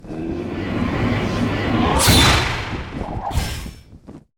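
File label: lesser demon attack, monster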